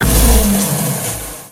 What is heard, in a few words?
scream; shadow tweetie